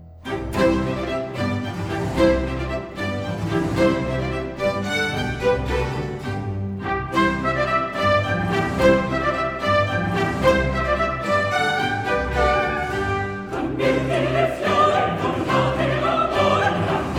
Tutti Coro; Scena Ultima; Con mirti e fiori; Antonio Vivaldi (1678 - 1741); Vivaldi - Orlando furioso (2004); Atto III

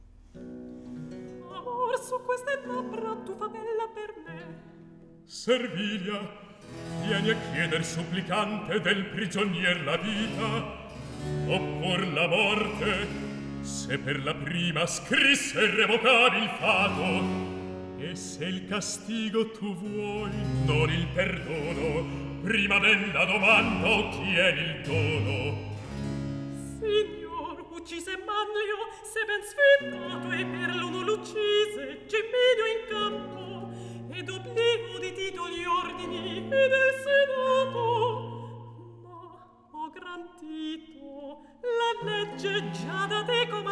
Recitativo; Atto 2 - Scena 14; Servilia, Tito; Amor, su queste labbra; Antonio Vivaldi; Tito Manlio (2005)

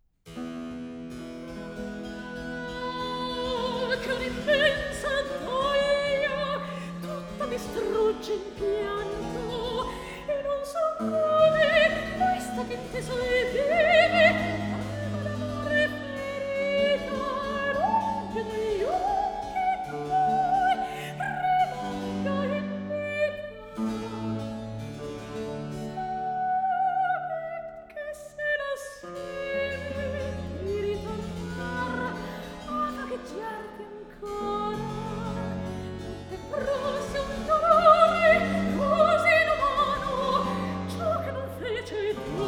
Cantata Recitativo; Rv 657 'Geme l'onda che parte dal fonte'; Antonio Vivaldi (1678 - 1741); Vivaldi - Concerti e cantate da camera II (2004)

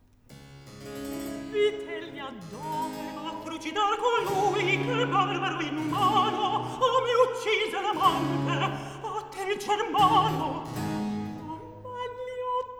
Recitativo; Atto 2 - Scena 7; Servilia, Lindo, Vitellia; Vitellia dov'e

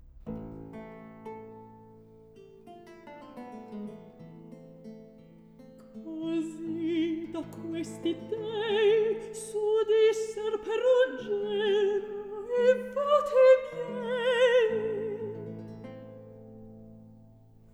Recitativo; Alcina, Angelica; Antonio Vivaldi (1678 - 1741); Vivaldi - Orlando furioso (2004); Atto II

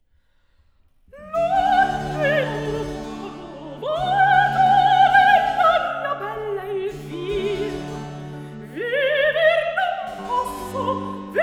Cantata, Recitativo; Cantata, RV 680 'Lungi dal vago volto'- I. Recitativo; Antonio Vivaldi (1678 - 1741); Vivaldi - Concerti e cantate da camera III (2005)

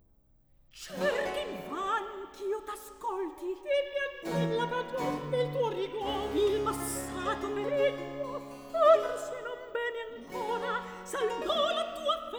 Recitativo; Atto III Scena 3; Cleonilla, Caio; Antonio Vivaldi (1678 - 1741); Vivaldi - Ottone in villa (2010)

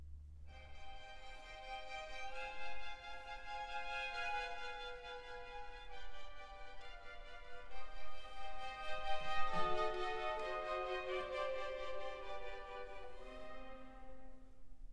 Aria; Atto II; Scene 8; (Griselda); Sonno, se pur sei sonno

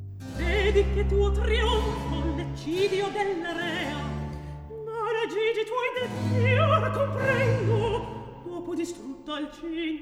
Recitativo; Antonio Vivaldi; Orlando furioso (2004); Atto III - Scena Ultima; Astolfo, Bradamante, Alcina, Ruggiero, Orlando

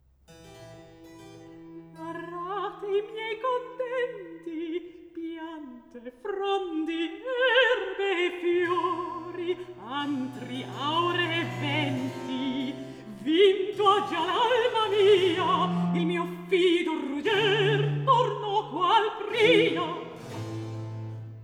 Recitativo; Bradamante; Antonio Vivaldi (1678 - 1741); Vivaldi - Orlando furioso (2004); Atto II

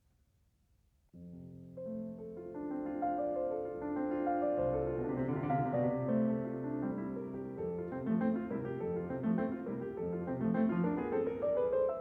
Preludio in fa maggiore, op. 28, Piano; Chopin - Piano Works - Vladimir Ashkenazy

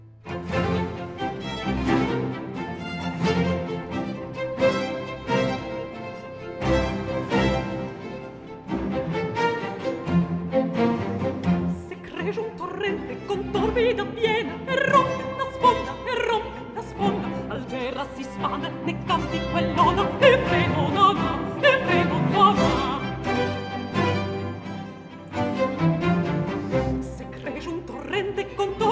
Aria, Se cresce un torrente; Atto II; (Bradamante)

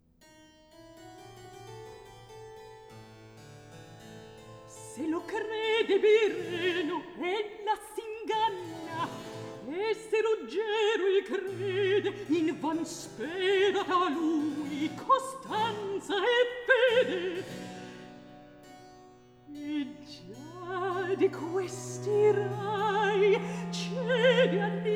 Recitativo; Atto I; Scena 13; Antonio Vivaldi (1678 - 1741); Vivaldi - Orlando furioso (2004)

